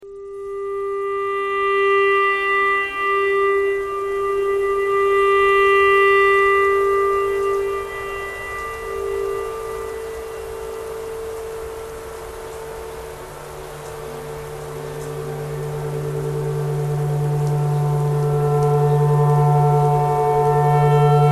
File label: tornado siren clarinet thread noise